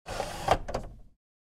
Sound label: Epson ET printer paper tray push in